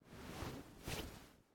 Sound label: Repose; raw game audio files; Mita; MiSide